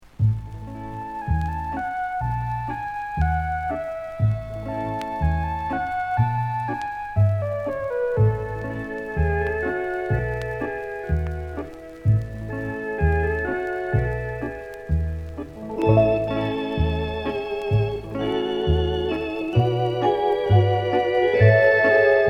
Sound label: orchestra